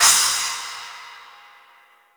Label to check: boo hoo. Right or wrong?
Wrong